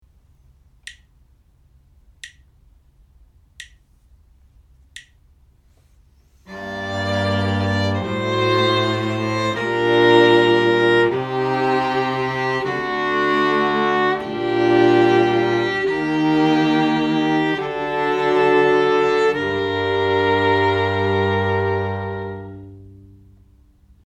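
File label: harmonic dictation, string quartet; ear training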